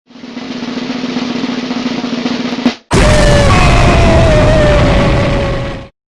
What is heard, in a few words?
Drum Boom!